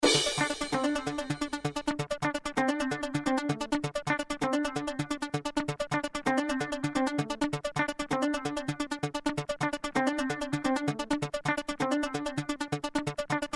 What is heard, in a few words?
funky